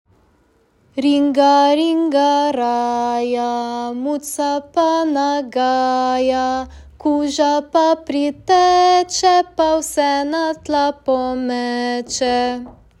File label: ringa raja slovenian; slovenie; musiques chants et comptines